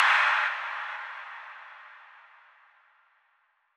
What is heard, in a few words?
FX Noise Burst Crash; Effects; Electronisounds